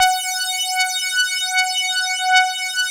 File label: DS8 F#4, Korg; Back In Time Records; Korg Universe VOL-1